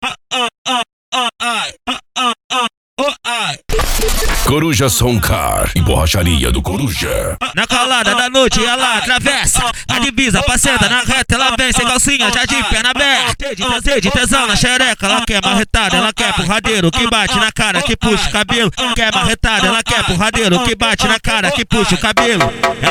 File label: funk; coruja som car e borracharia do coruja